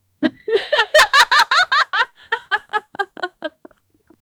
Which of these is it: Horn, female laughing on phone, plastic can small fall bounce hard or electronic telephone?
female laughing on phone